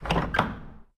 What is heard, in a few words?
Tower Open Door